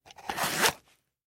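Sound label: police baton sounds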